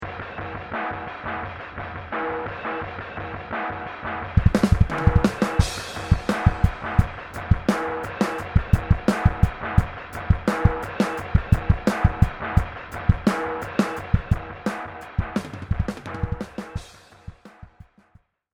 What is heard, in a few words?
groove with loop